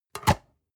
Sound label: storage lid slide close hard, box; objekte